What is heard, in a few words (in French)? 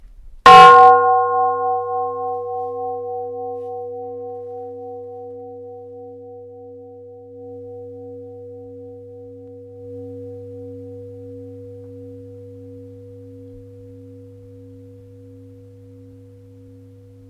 Chine Emei Shan cloche